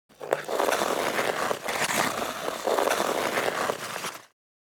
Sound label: Ice hockey stick puck handling